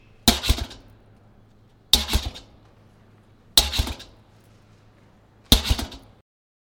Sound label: Trash Can Lid